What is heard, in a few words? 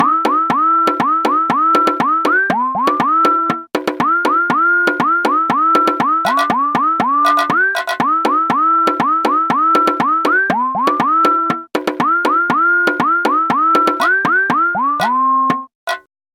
Christmas Song